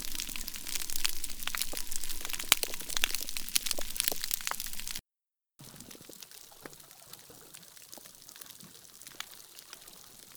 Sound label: Healthy then degraded reef sound